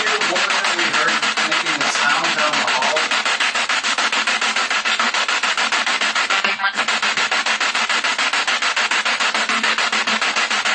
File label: Kitchen Spirit Box